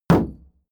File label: shield sheet impact dent